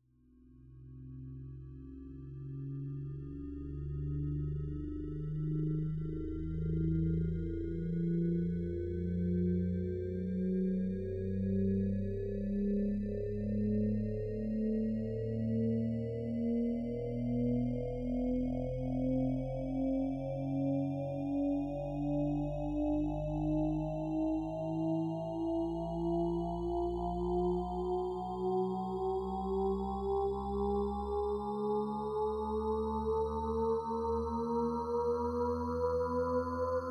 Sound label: Uplifter Whole